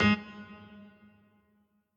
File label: piano riff intro